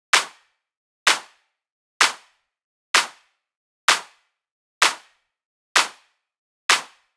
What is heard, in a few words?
Drum Loop Clap 128, Melodic Techno; Flow; Ghosthack; AC2024 - Day 12 - Melodic Techno